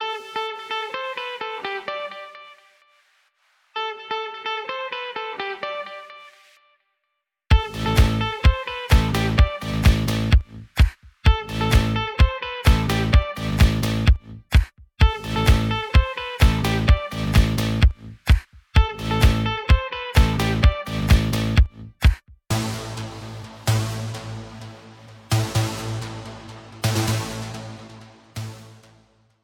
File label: Techno Triumph